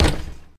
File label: Street Ball Glass Back